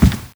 walk wood

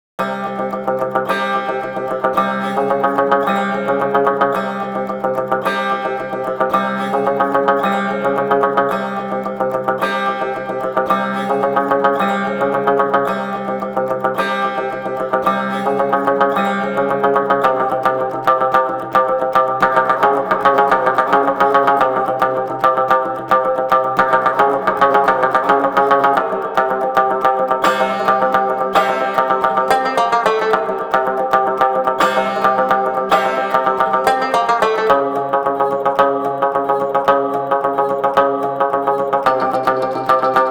Acoustic; Talon Sheath

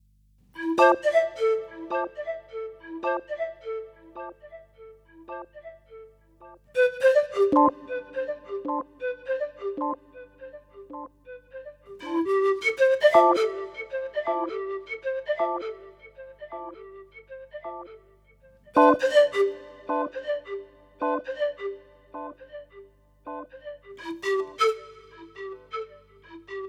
swamp bgm 80bpm; chicory a colorful tale; ps4

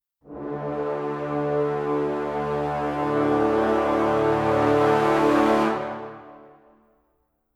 Combat In; Skellige

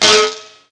machine; Gonner

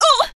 pain; calliope

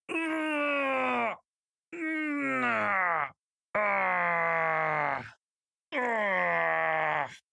moan

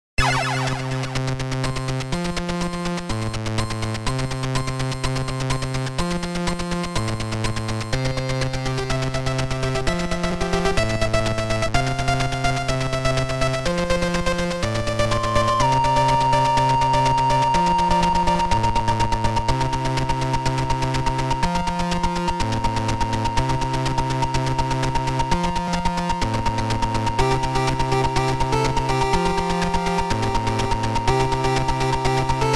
Warning; 3D Tetris; Virtual Boy

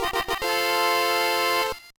Win; mario party advance; Secret Battle

